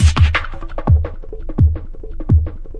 171 filter down breaker; 161 to 180 bpm

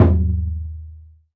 taiko; ETHNIC and WORLD PERCUSSION

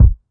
kick, Classic Hip Hop; STYLE KITS; Classic Hip Hop Mega Kit

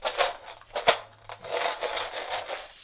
169, drum loops and breaks; 161 to 180 bpm; can of peanuts

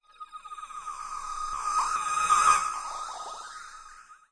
flange noise fountain; Various Strange Sounds and Effects

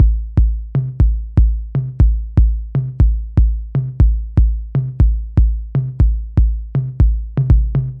120 bpm 808 kick and tom; drum loops and breaks

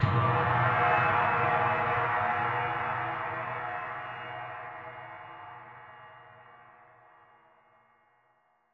drumsplosion spoosh, electronic hits

